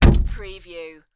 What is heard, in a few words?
Door Wood; Sound Effects Bible